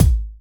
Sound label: rich high end bass drum A key; kick